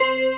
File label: count multiplier; Amatic; Lucky Zodiac